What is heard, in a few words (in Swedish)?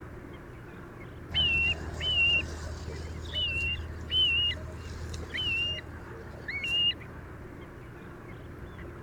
Kustpipare